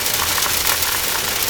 Loop Powder Pour

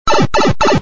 Pipe travel Power-down; Super Hermanos Mauricio

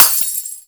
Light Bulb

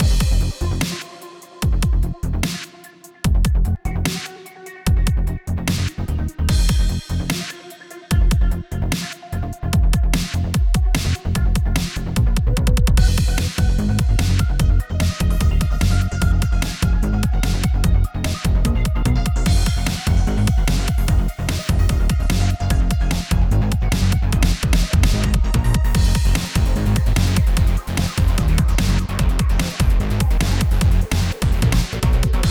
Breakout Frantic Mix Stem Layer; Breakout Recharged

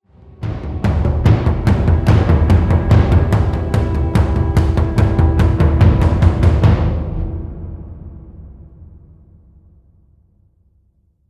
Perc Build Melody End

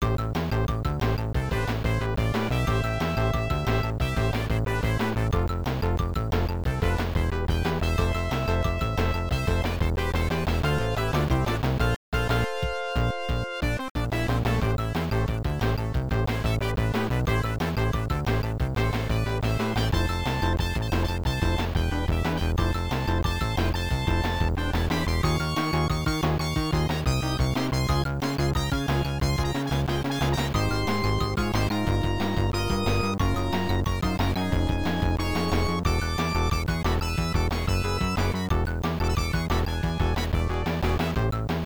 Set Match BGM

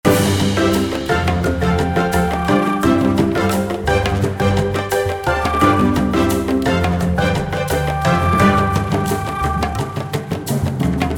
Win Loop; Temple Run Treasure Hunters; android

